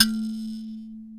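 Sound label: A2 mbira right; WorldSounds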